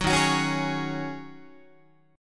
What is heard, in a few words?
D sharp Minor 11th D sharp Guitar, chord; strummed; JGuitar